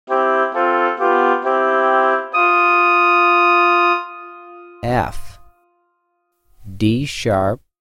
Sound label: F3 D#6 Oboe 2 Note Classic; Level Three “Classic” Example; ear training two note